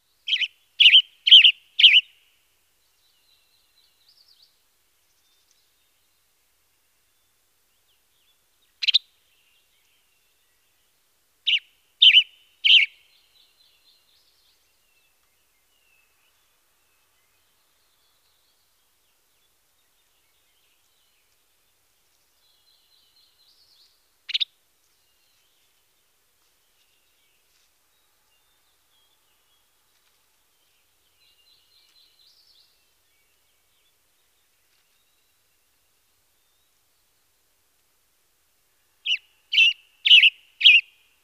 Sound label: Tanager Western Chir